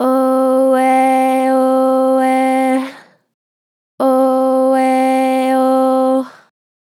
Vib Ohh Eh Ohh, Vocals, Natural Vibrato Strong; Harmor Vocals

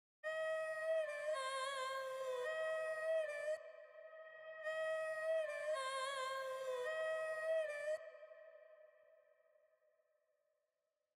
109 BPM C Min Voice; Cymatics; Mummy; PHARAOH

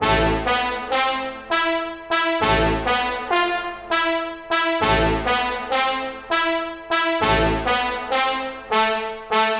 Moonlight Brass Loop 100 BPM A Min, EDM; Instruments; Brass Loops; Cymatics; EDM Starter Pack

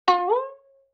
Violin Pluck Pitch Rise

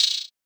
Perc Rattlesnake; Drum One Shots

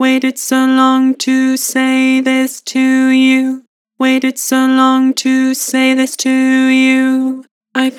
Waited so long, Vocals; Harmor

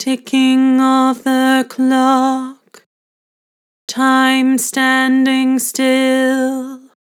Vibrato, Vocals; Harmor Vocals

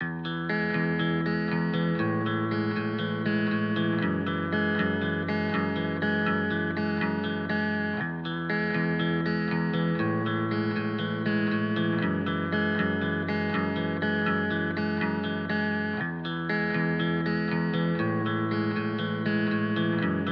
120 BPM E Maj Main Guitar; Cymatics; Miami Guitar Pack - Pt 2; Losing

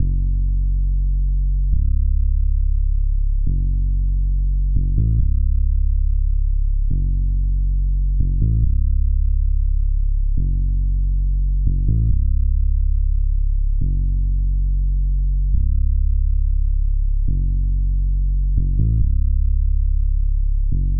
139 BPM C# Min Bas; Loop Stems; Cymatics; Benz

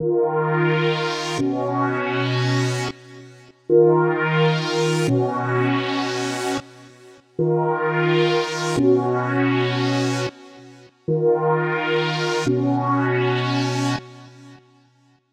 130 BPM D# Min Pad; Melody; Loop Stems; Cymatics; 2022 Melody Collection - Wavy; Run Dat

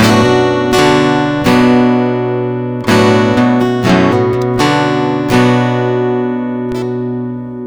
Guitar - 125 BPM (B Minor), Acoustic Guitar; Empty Bottle; GhostHack; Free Guitar And Piano Samples

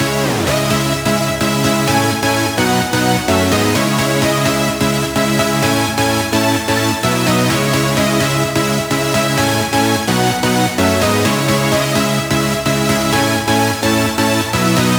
Super Saw Loop 128 BPM C#min; House; Synths

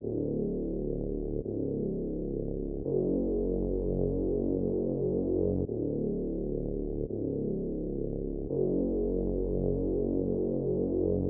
Dark Melody Loop 170 BPM F# Min Bass; Loop Stems; Cymatics; 2020 Melody Collection

